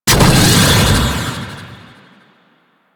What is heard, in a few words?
Stealth Boost Thruster; Warframe; Vehicles; Archwing Stealth